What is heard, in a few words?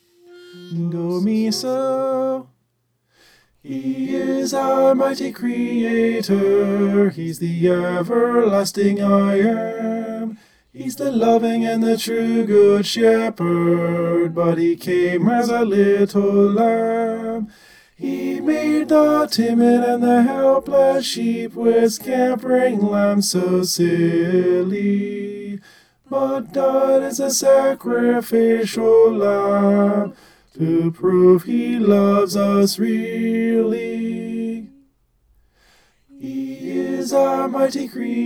he came as a little lamb tenor